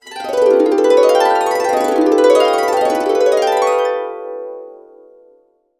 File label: A CASC UP DN, Harps